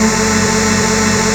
303 Acid F#; Vengeance Samples

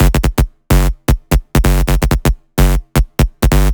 128BPM Big Floor Groove Melody; Vengeance Samples

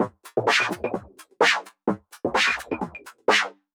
VFX2 Loop 128 BPM

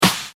Snare, trap

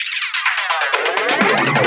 Fill Ins 128BPM; Vengeance Samples

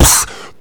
Beatbox Snare, Human Beatbox; Vengeance Samples; Special Sounds

